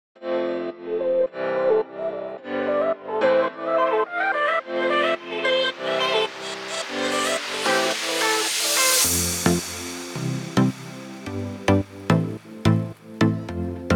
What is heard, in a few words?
Tropical Beach, EDM Pop